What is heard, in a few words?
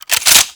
Sniper Rifle Reload; Warframe; Grineer; Gorg